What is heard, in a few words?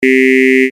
Vocal E